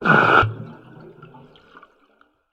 air cannister spray release underwater short burst; industrial